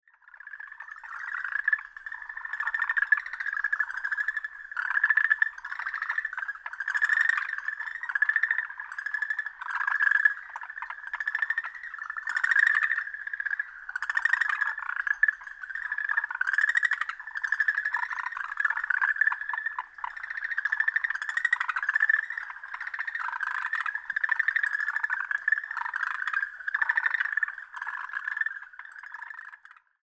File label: okinawaaogaeru call; yanbaru